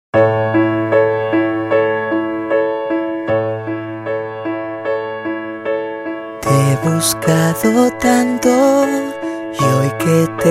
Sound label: kalimba